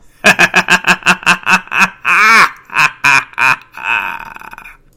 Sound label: laugh; freak fortress 2; graymann